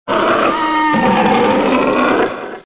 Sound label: Grunt; yeshoujiaosheng